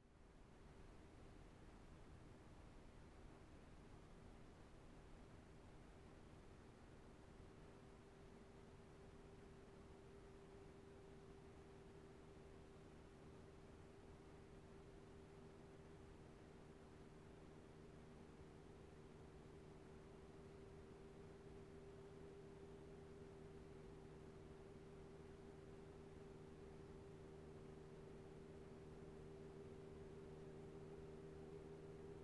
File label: Motorcycle approaching, turning off